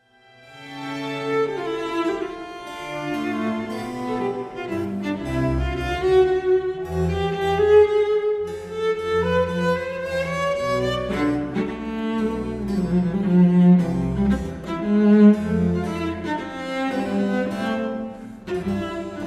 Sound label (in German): Cellokonzert a Moll Ausschnitt; C. Ph. E. Bach